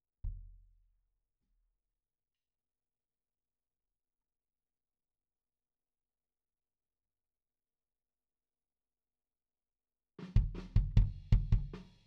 Kick IN

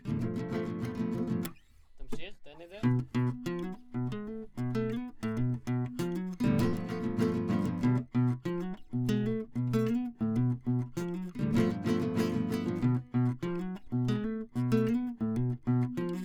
Classic Guitar

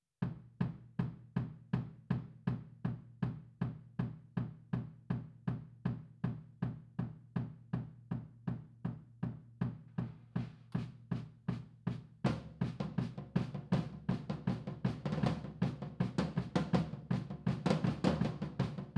HiHat, 160 BPM

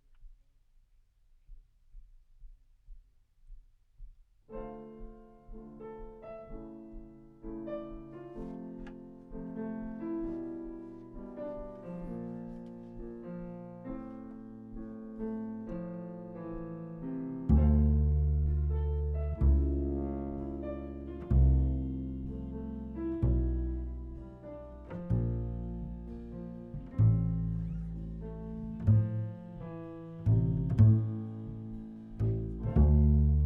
Bass

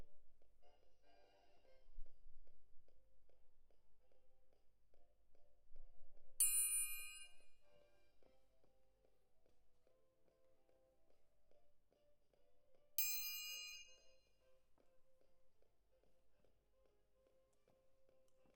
Perc Close

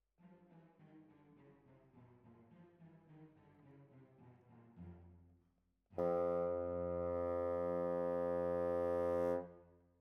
Bassoon, Jazz for piano

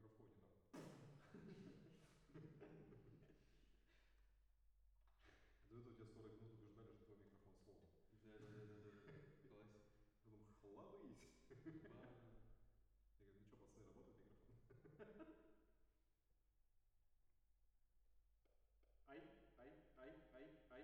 MKH AB, piano

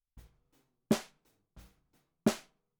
SNR Bot

